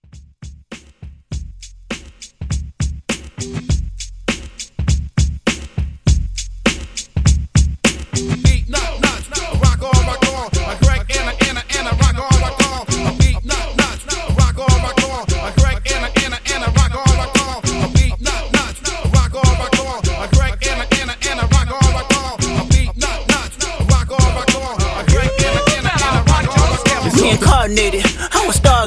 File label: Mashup 101 DIRTY